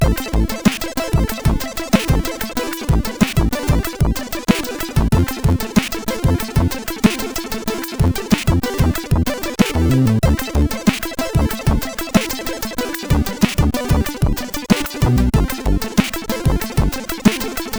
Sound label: Loop Version sid CSG8580R5